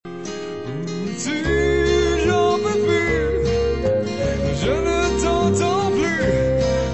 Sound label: Harmonium